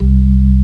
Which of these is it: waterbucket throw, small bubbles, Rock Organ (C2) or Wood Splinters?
Rock Organ (C2)